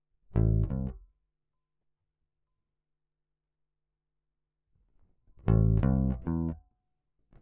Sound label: Bass